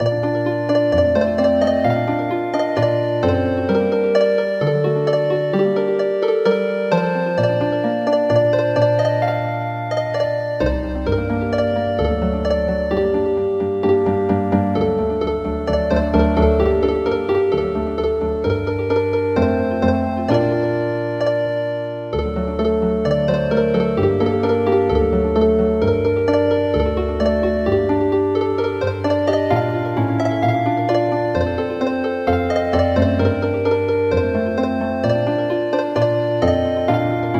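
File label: Healing Harp; Ukraine has not yet perished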